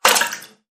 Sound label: Blood Splash Hit; Red Dawn